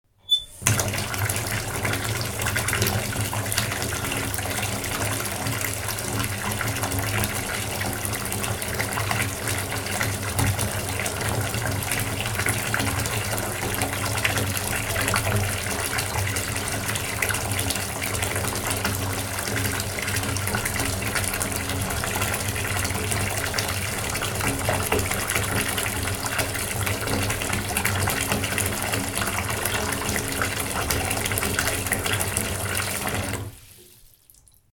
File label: water